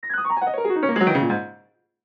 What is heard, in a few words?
Piano Gliss, Piano Gliss Descend; Black Keys; Pianos; Blastwave